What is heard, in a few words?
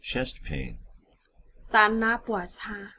chest pain